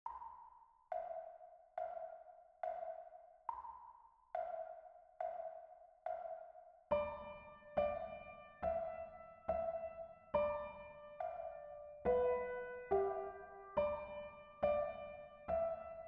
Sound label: Backe backe Kuchen melody; Kinderlieder; gitarre guitar